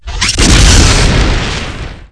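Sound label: plasma explosion, weapons; quake2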